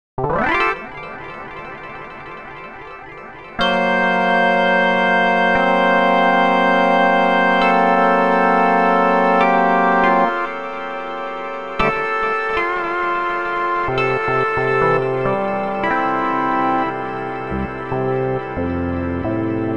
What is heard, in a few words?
Tempo Delay